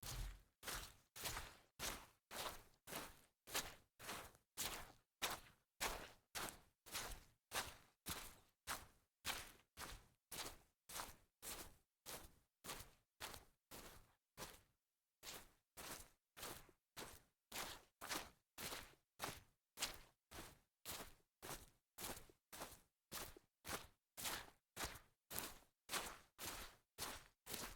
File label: walk on soggy grass series, foley